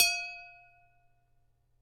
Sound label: Glass